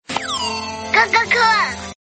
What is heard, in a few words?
cool short sms tone ringtone